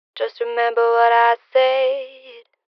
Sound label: Phrase Dry 80 BPM C Min, Vocals; Remember; Cymatics - Eternity Sample Pack; Phrases